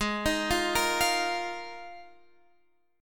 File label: D sharp Suspended 2nd Suspended 4th